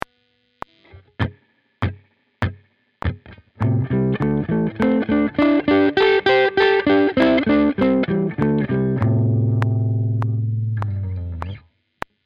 blues, guitar

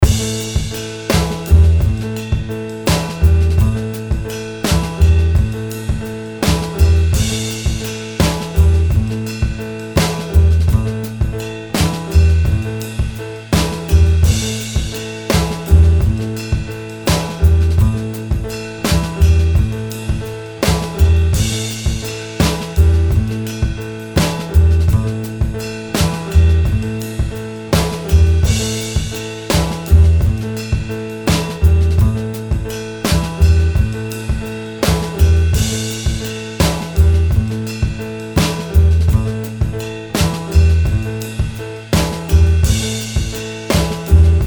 improvising backing

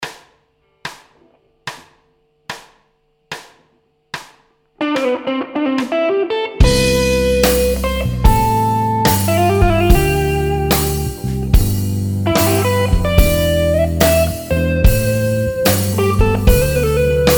improvising mix, guitar

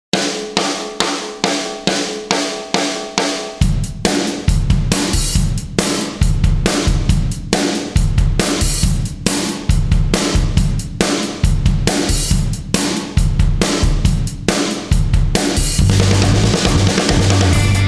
backing

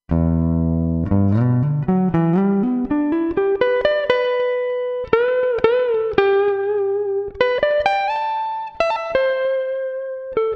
shred, guitar

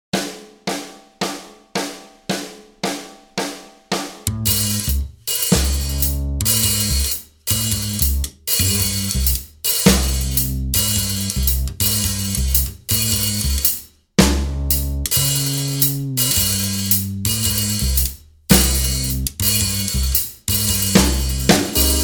improvising backing, guitar

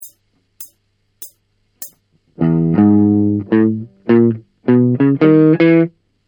blues

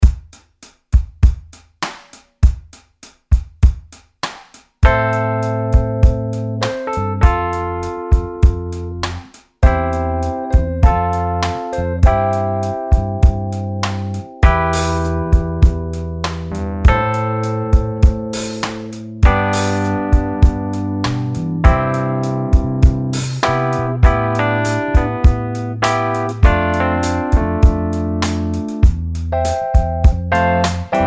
slide backing

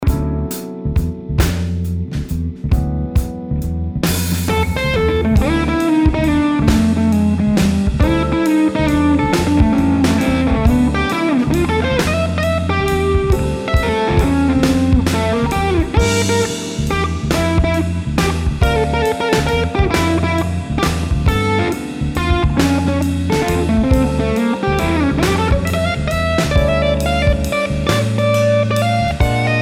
improvising mix

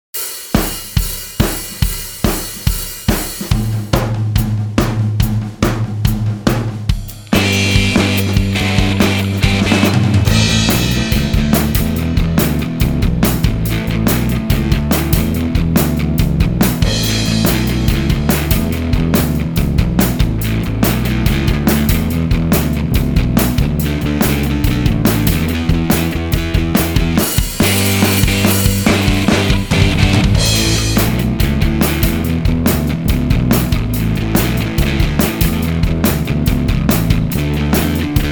backing

